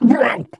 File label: ballbot pain sm; player; Portal 2